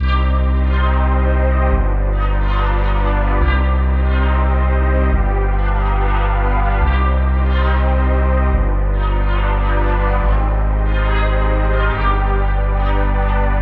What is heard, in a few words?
141bpm Cm; Articuno